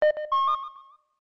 sos beep

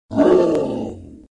troll attack; skillfite

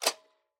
confirm

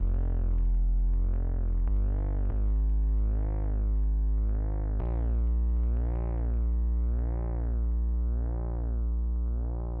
BASS, viral hiphop

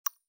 hover

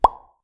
pop

